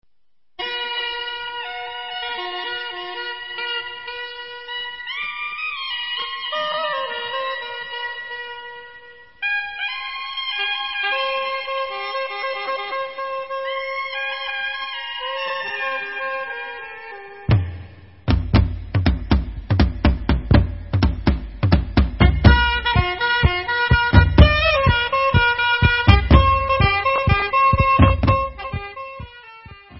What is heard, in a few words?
Debka Instrumental